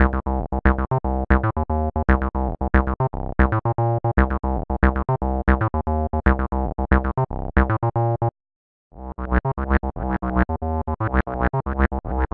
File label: Acid Bassline